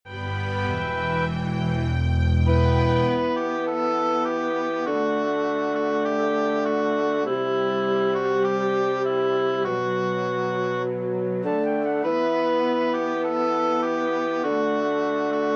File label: menu, music; scourge